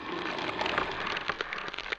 Skeleton Idle

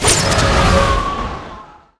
power shot

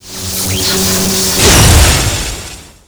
Mage Skill; Fargels; Gamigo

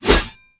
Little Hob Attack

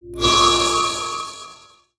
Light Touch; Seer; GamigoNA

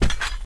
Foot

